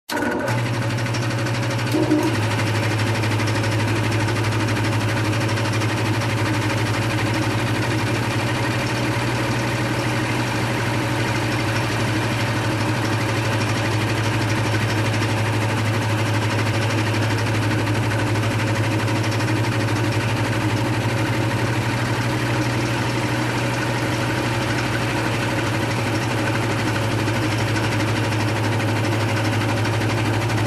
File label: Concrete Mixer Rolling